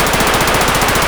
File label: kedr loop indoor distant; Escape from Tarkov